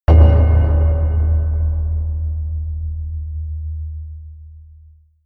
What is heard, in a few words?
sub metal impact, hits impacts